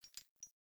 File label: Grunt Foley Movement Chains; Enemy; Sinecure; Melee Grunt